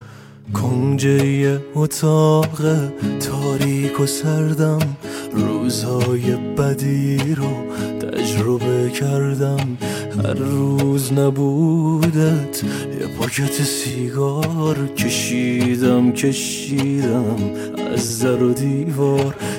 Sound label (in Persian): Ba Gitar